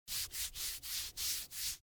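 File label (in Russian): звук кисти; Звуки кисти художника или маляра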